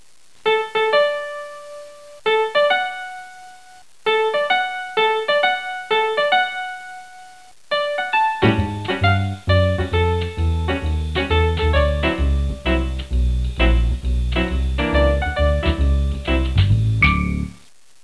Joy, happymusic; 特酷声音素材库; 背景音乐

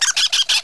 Bird; 特酷声音素材库

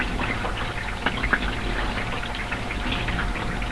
Water, 水声; 特酷声音素材库

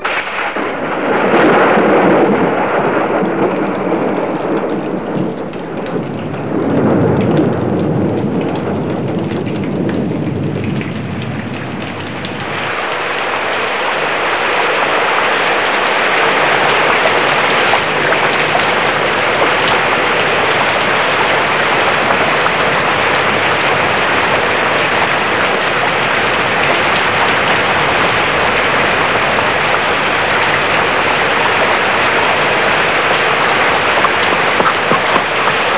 Rain, 雨声; 特酷声音素材库

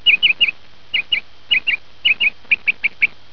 Bird; 特酷声音素材库

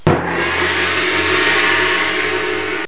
Joy, happymusic; 特酷声音素材库; 背景音乐

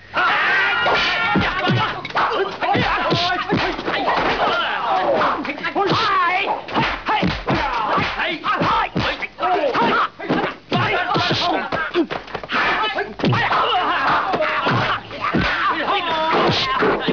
Fight; 特酷声音素材库

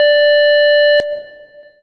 start; 暴力赛车

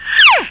Cartoon; 特酷声音素材库

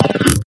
Confirm; 暴力赛车

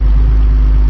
Sportscar; 暴力赛车

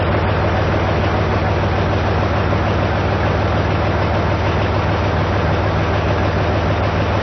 Truck Idle; 暴力赛车

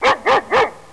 DOG, 狗; 特酷声音素材库

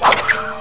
Fight; 特酷声音素材库

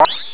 bad Guy Hit; flash MX 2004 Game Programming

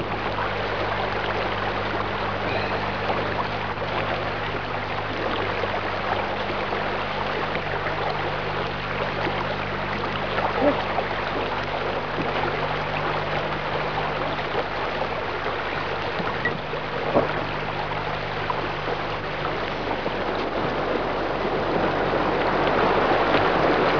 Water, 水声; 特酷声音素材库

